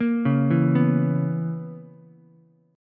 B Major 7th Suspended 2nd Suspended 4th B down Ukulele; BM7sus2sus4; arpeggiated; JGuitar